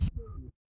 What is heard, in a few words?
feedback fail